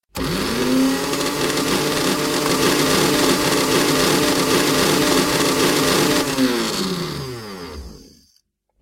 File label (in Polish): mikser